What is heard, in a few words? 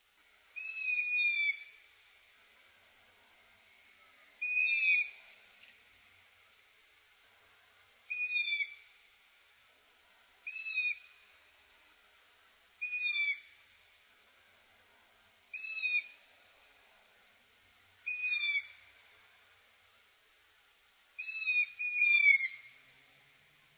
asiotu juv, balsis; iecava; g.rozners